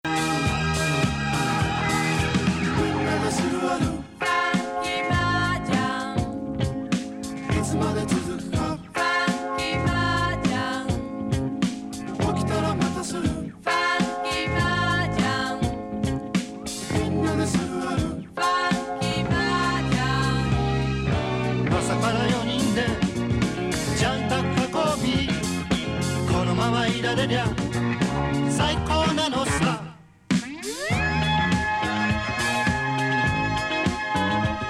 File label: funky